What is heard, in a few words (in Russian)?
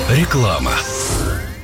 реклама начало; vesna fm